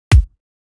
bass drum; LaRochelle

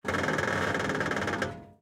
Alchemist Creak

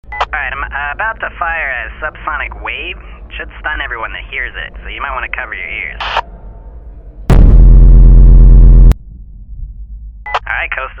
Sonic blast

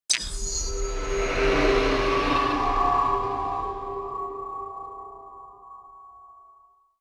ui academy building lot highlight sparkling

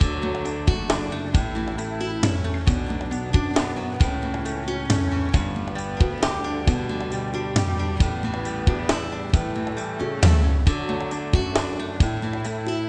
Foyer After Loop

